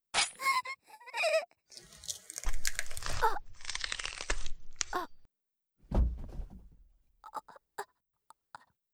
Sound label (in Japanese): 胸を刺す